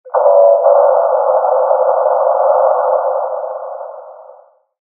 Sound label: midband noise ambient dry